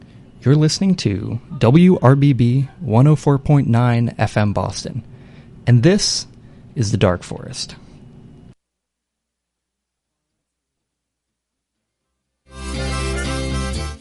folk punk

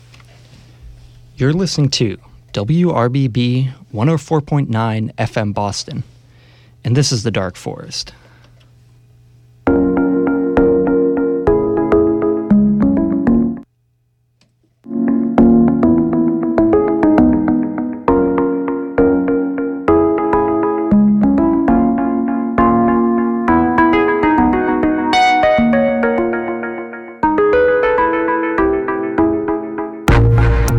glitch pop

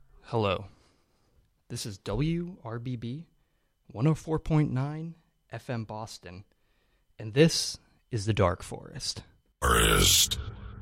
post-rock

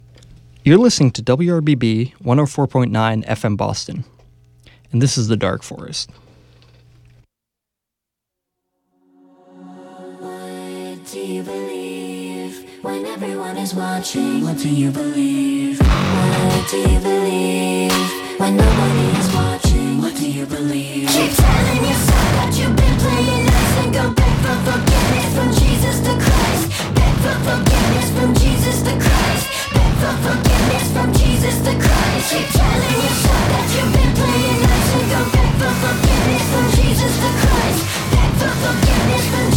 industrial pop

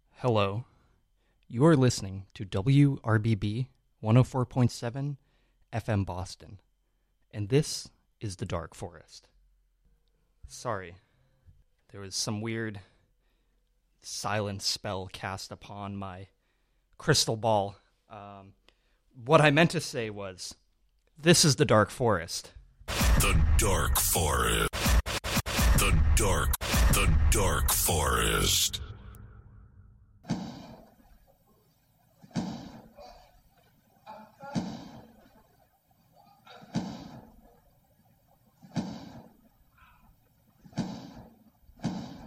industrial

